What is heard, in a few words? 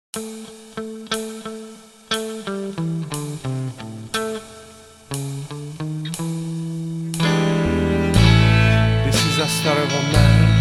Blues